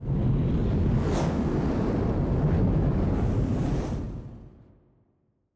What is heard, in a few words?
kaiju superweapon fire swirl; biogenesis